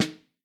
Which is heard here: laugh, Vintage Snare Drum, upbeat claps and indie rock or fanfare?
Vintage Snare Drum